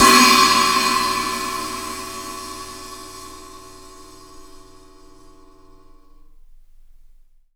SWISH16; Big Fish Audio; Ross Garfield Drums VOL-2

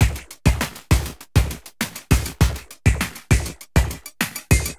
Beat, Industrial

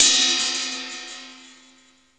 DEEP RIDE, JAZZ; THE JAZZ

